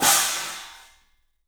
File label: CYFX, EFFECT CYMB; 3D Sound; 3D Drums